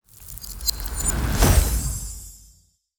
crystal magic intro

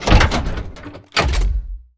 pull lever

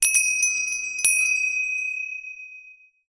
wind chime; JP

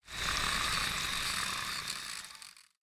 seaborn weak

